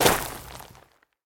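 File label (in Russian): удар огромным камнем по земле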